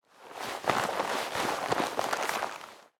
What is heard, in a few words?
MOVEMENT PEOPLE; SIMUZENSOUND